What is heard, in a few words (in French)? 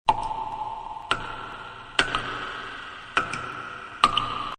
fond gouttes; Collection Synesthésie; Isabelle Grosse